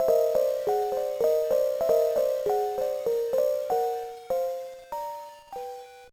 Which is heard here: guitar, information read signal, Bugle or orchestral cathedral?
Bugle